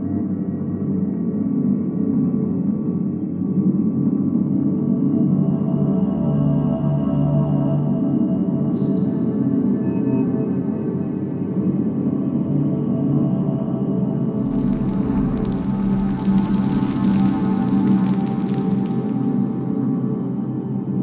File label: Cave Ambience; AM2R; AM2RLauncher